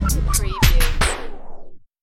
Turntable Scratching Stop Brake

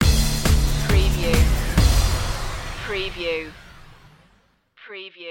hands held high stinger

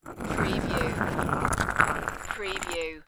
Small Stone Grind

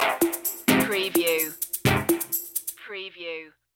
tribal drops stinger

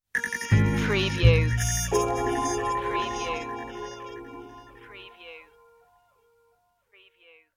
stoner beat stinger

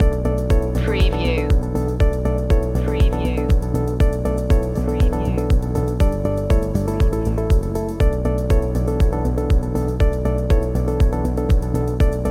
a perfect pitch loop

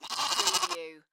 Lamb bleating; beanstalk audio

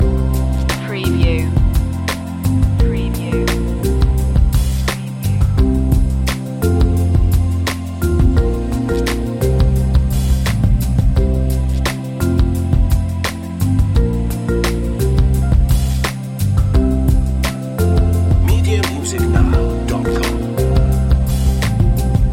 lounge isle loop

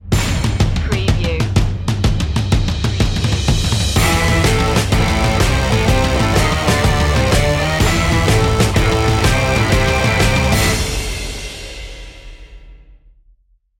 absynth stinger

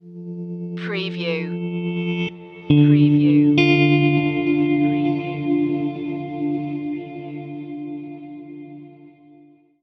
slow down stinger

